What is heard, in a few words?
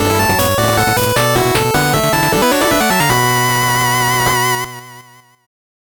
8bit jingle normal